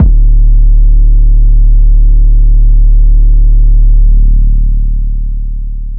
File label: 808s, D